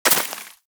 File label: Bullet Impact Debris Snow